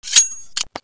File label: karambit draw; csgoknife